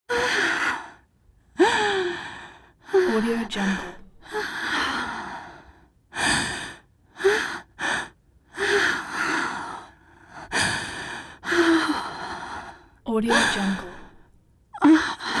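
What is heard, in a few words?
female moaning close up slow inhales exhales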